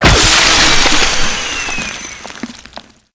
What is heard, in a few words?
Saw Impact Flesh, Blade Impacts; Warframe; Grineer